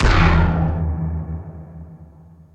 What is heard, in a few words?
FX WIND DO, Techno Trance; E-MU Formula 4000 Series Vol. 2